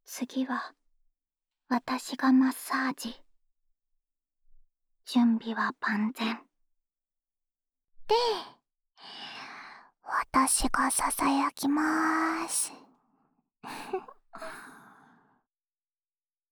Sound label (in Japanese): お耳をあわあわマッサージ, ASMR